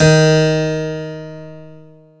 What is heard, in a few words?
KEY HRPCR, Roland; Roland - LA Composer vol. 1